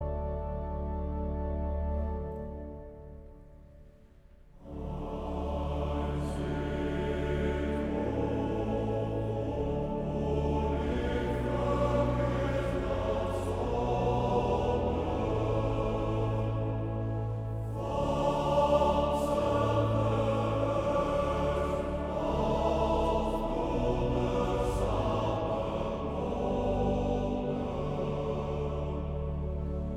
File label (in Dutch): Koor mannen